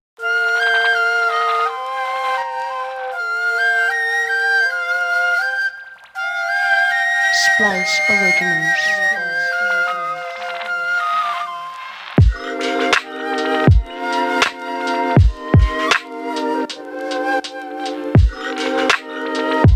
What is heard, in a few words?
flutes of japan; splice originals; kaoru